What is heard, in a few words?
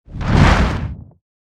Rock Rumble